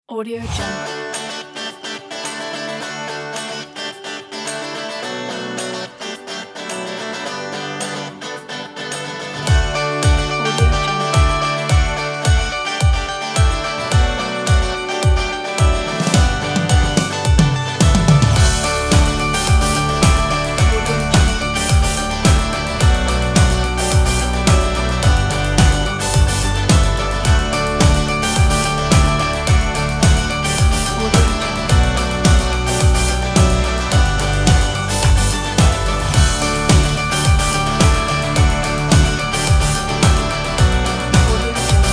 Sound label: upbeat guitars pop rock